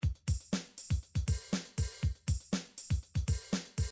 midi drums drums dance

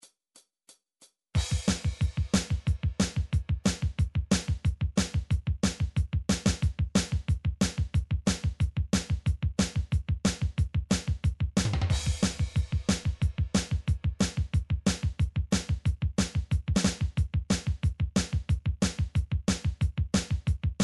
heavy; Drumtrax Library

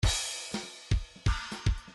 grooves funk; Midi File

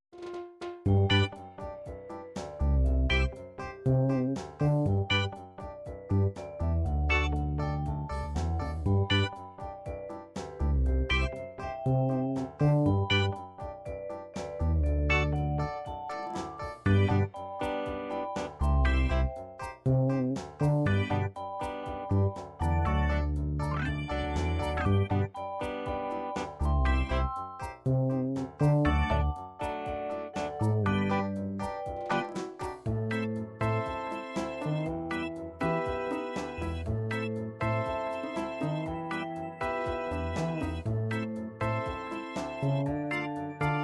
reggae, midi

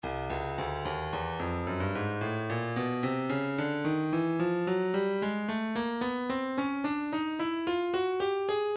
breakbeat 055 bpm; Stylus Breakbeat Midi Files; Phew & Phar A

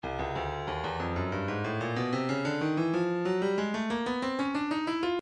breakbeat 093 bpm; jamma gyro c; Stylus Breakbeat Midi Files

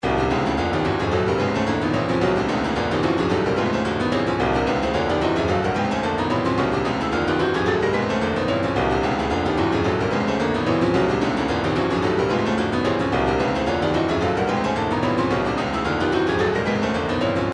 retro funk 110; pygmy

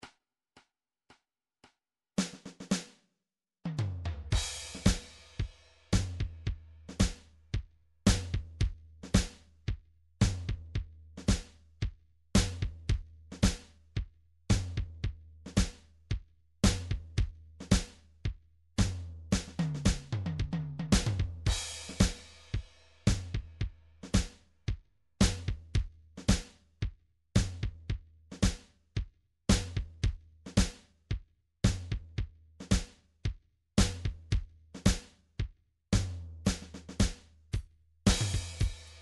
hardrock

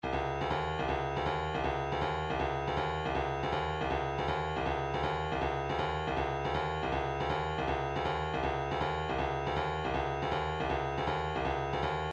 159 native drum, midi; Pacific Rims

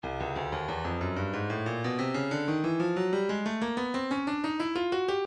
stylus breakbeat midi 092 bpm; Deeper Still

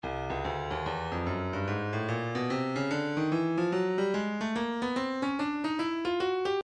Percussion Bongos, midi; Stylus

